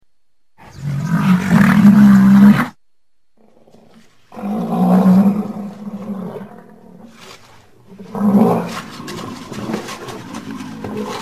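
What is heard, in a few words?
nosorog, rhinoceros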